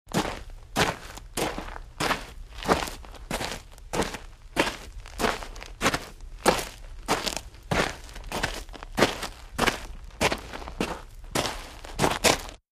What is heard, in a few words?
Boots Wstrn Boots